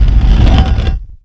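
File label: Se Dungeon Door; Etrian Odyssey III HD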